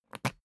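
briefcase plastic latch lock key pull out quick, Büroarbeit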